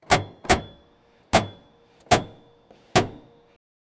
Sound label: realistic car repair soun, workshop